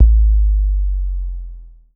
DROPKICK, bass drum; BASSDRUMS

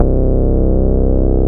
BASS MINIMOO

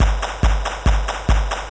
DRUMLOOP TEKNO 140; Techno2

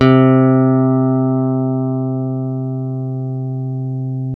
STR Long, Strings

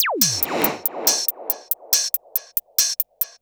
electro beats 140

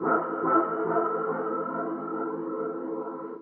techno pad 140; Techno2; Keys

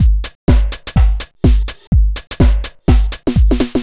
HOUSE BEATS 125

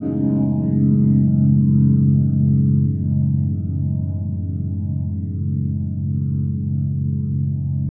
Atmos FX, Atmosphere & FX; Loops; Touch Loops; BlissedOutBeats

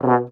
B1, French Horn Stacato; DirectWave; Varazdin Orchestral

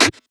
perc; Anthemic.Electro; drum hits

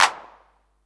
909 CLAP, TR-909 DRUMS

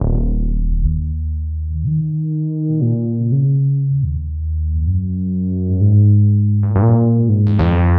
120 F# Wine drunk, Bass Loops; Touch Loops; BlissedOutBeats

